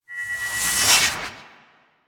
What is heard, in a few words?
Melee Heavy Attack Charge, Weapons Melee Whooshes; Warframe; Heavy Attacks